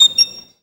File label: VIOLIN BIRDS; Best Service; World Colours